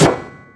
metal solid impact soft; physics

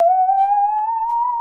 saw danger, music, zombat

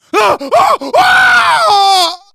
death scream; player; survivor; voice

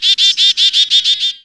single bird

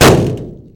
metal sheet impact hard; physics

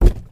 cardboard, footsteps infected run

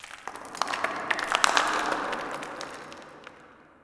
debris random, ambient

